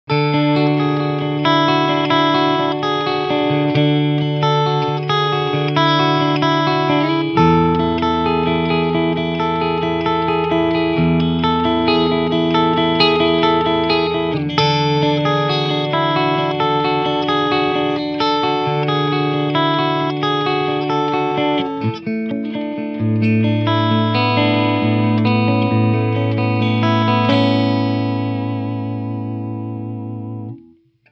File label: Channel 1 Clean Picking